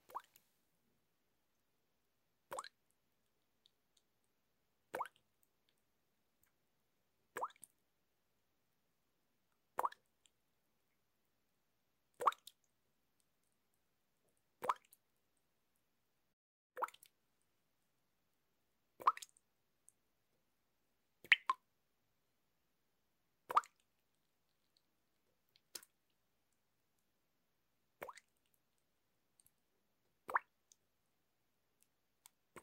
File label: Water Drops